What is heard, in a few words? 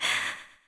poison cloud; assassin; skill